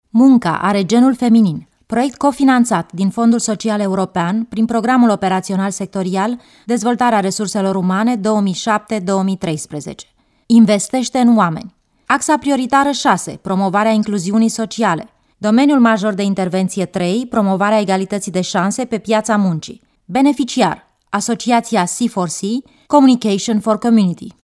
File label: jingle